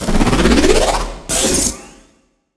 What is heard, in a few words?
burst discharge reload, weapons